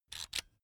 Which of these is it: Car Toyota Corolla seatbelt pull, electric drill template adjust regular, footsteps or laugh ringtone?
electric drill template adjust regular